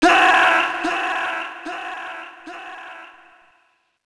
death; snake; mgs

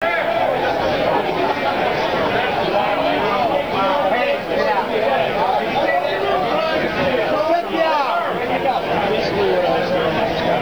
trading pit; ProjectMobius; ambient; enviroment

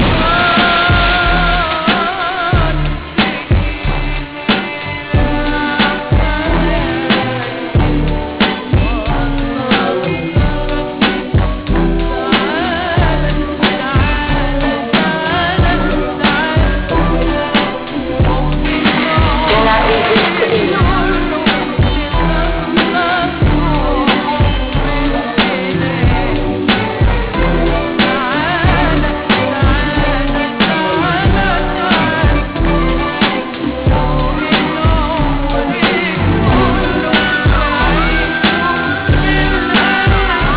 Arab Funk Loop

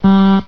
alarm

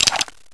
weapon drop

